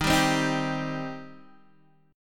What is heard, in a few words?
Eb 6th Eb down Guitar DADGAD